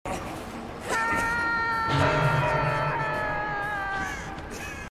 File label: hanged; Dead by Daylight